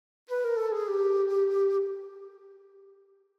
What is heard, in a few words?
Flute Short Light Flute Melody; Hollywood Edge; sound designer's toolkit v2